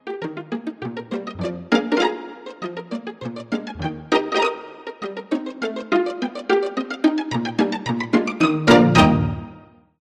pizzicato strings

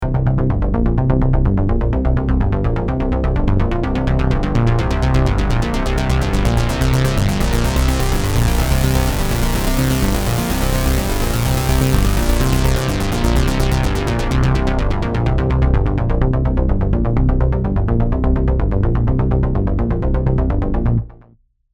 multi bass

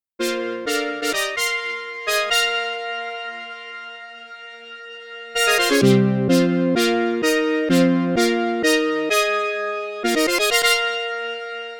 brass crush

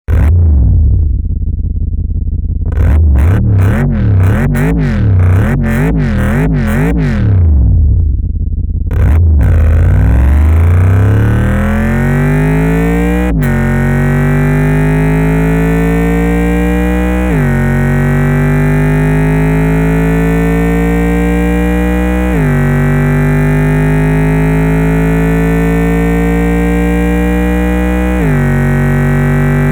luxury v8

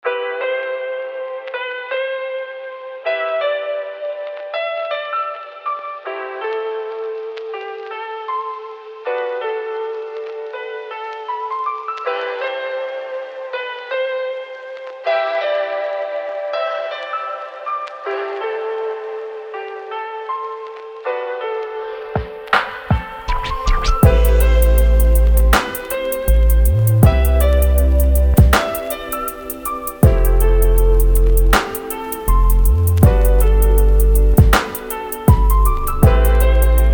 chillout waves; glitchedtones

